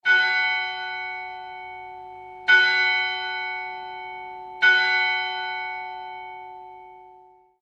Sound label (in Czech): zvon marek; zvonohra